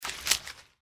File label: mantis arm attack; npc